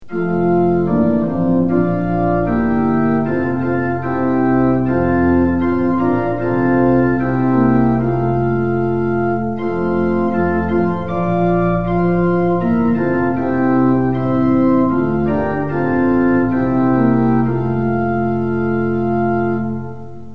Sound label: tune; hymns; lillenas